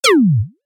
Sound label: shooting